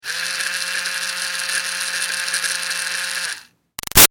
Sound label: Toy Fairy Wand CU Run Short; Miscellaneous Toy; Toys; Big Room Sound